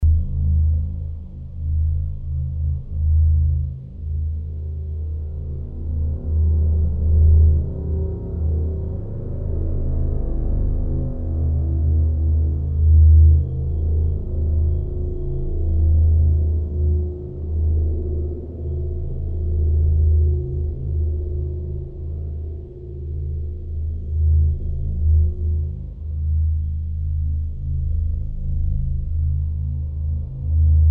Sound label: Cerberus ambience